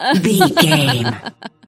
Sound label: voice female laugh